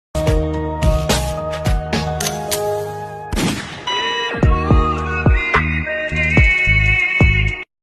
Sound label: ringtone slow reverb